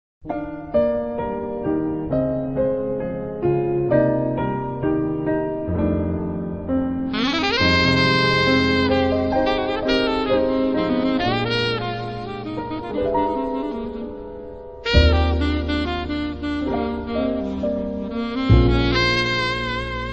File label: Alt Sax